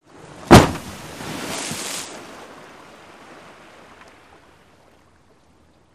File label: Drop Impact Vehicle Dropped Into Water, Shot From 20 Feet Away